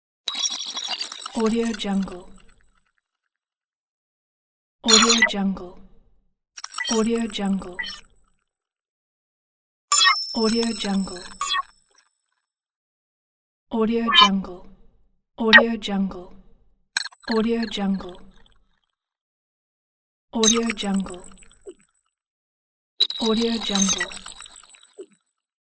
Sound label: digital